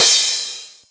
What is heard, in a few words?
Crash